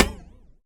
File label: impact Plate light